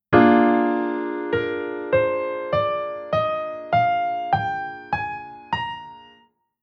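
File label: Chord Scale Minor Natural Minor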